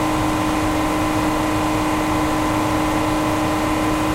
valentine engine rpm; cannons; War Thunder

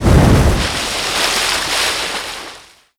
explosion water mortar; Explosions; Call of Duty Modern Warfare

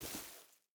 footstep rubber boots grass walk; Rust

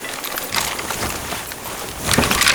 weapon toss tosser; Call of Duty Modern Warfare

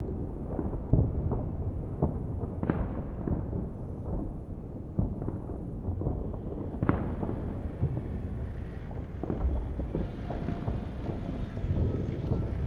ambient loop; War Thunder

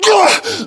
pain, english

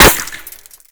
impact masonry; weapons; Modern Warfare

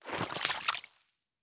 stop puddle; Escape from Tarkov

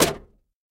bullet hit outside; War Thunder

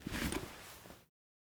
2handed mace deploy; Rust

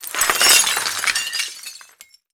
glass window pane breakout; Explosions

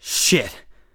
fight end; Escape from Tarkov

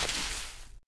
foot forest; Civ4

